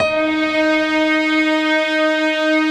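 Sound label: D#4, PIANO STRINGS; Best Service; Advanced Media Trax Modern Composer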